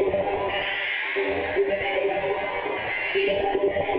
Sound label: Tonal Re Draw, sparse soundscape; Loops